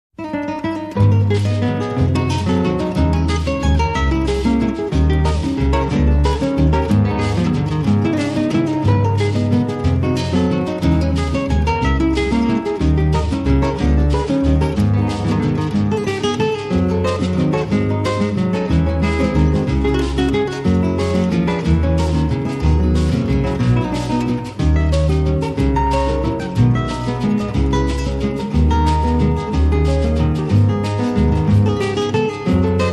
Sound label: Vals Criollo